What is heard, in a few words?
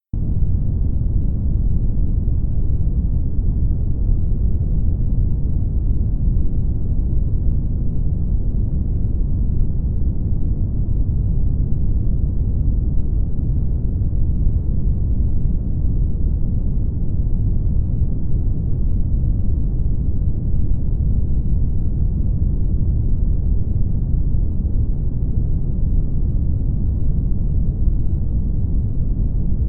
space sound